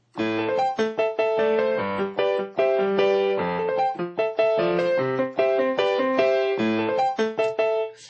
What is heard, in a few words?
piano